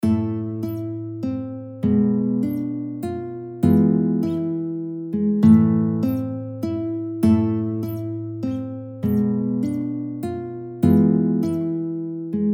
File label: Vechir nadvori Am BANDURKA